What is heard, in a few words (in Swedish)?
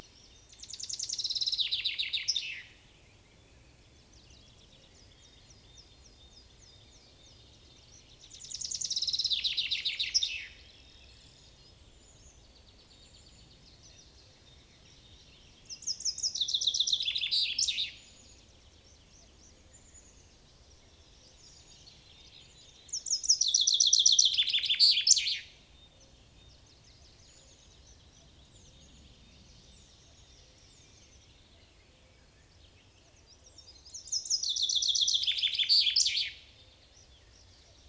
bofink Fringilla coelebs